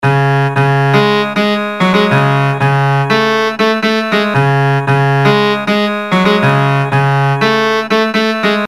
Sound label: disco